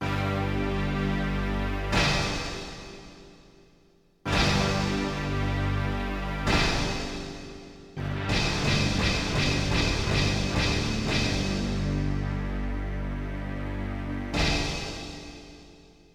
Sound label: sting